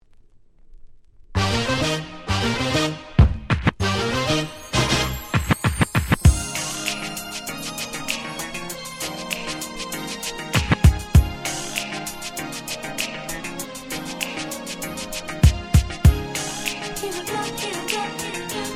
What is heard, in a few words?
piano